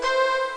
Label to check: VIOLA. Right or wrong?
Right